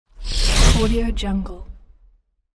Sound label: fast whoosh; infinity-sfx-productions